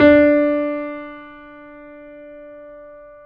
BOSENDORF

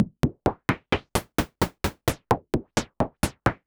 Modular 130BPM

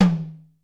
XE8 TOM; Crypton - DrumBase5000

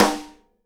SNARE RIM, Live Snares

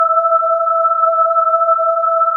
GIRLS CH, Synclavier Sampler

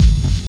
BD, Dark Side Of TripHop

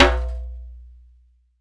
DJMB OSL, DJAMBE, Africa

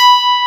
RHODES G4; ELECTRIC PF#3

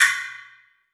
Guiro; Essential Percussion

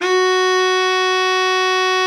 BARITON, BARITON SAX

